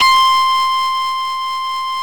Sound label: bend C6; HeavenStudioPlus; rockers